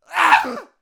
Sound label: Death Vocals; Divine Arbiter